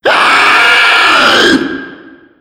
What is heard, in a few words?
zombie infect